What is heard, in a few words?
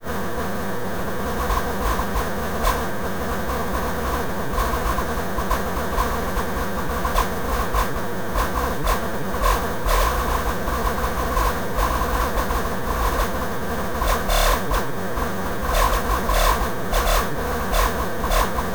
NEON TEXTURE Zapping Stress; boom library